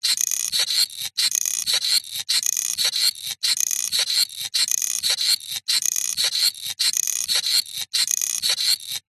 industrial machine cogs gears small mechanical sequence; zapsplat